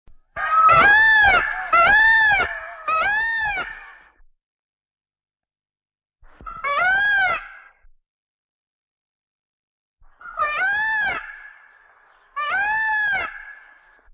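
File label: peacock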